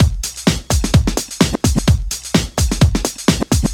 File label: House Beat, 128 BPM